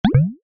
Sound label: cartoon water drip large